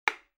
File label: ebook open; Oppo A5s